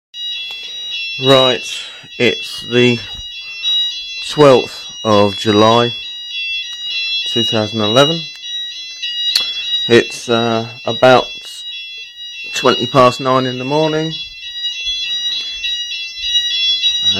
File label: fire alarm